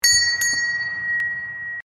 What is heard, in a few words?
notification Sound